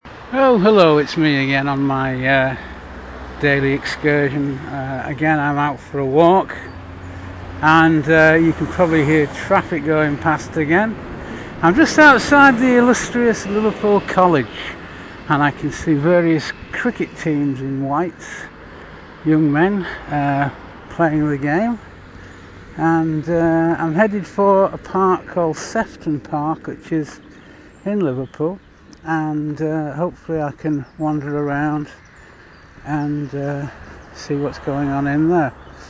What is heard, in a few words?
walking in liverpool parks